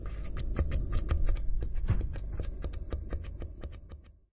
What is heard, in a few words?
lava, liquid